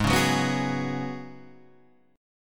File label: Gb Suspended 2nd Suspended 4th Ab